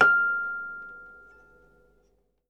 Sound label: H F6, Percussion & Harp; Miroslav Vitous